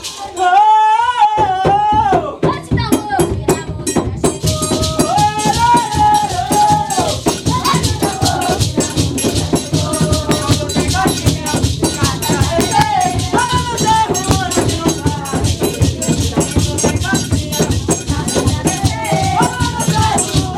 tenda sao jose bate tambor

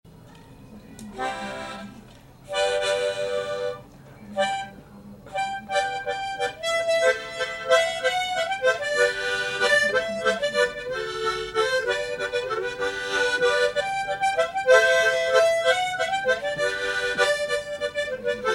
gaita

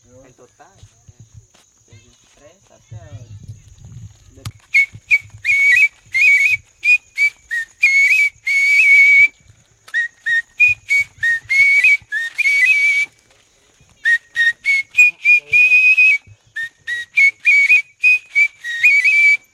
timbiras

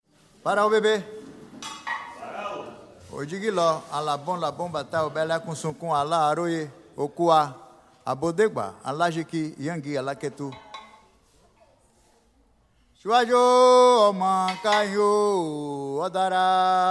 toada para exu

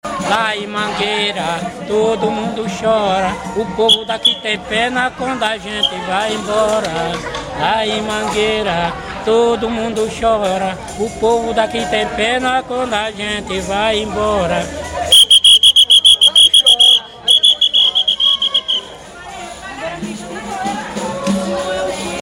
mangueira

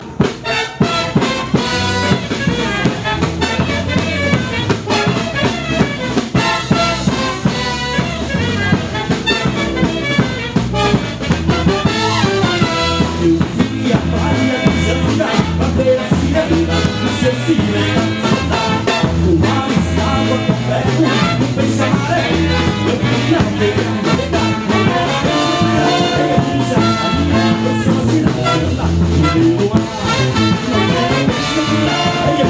frevo e ciranda carnaval

